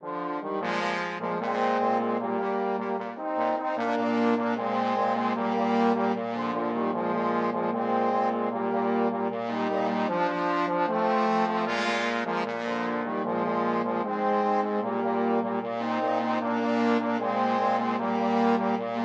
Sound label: trombone trio